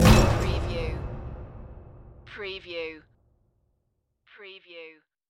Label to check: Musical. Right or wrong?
Right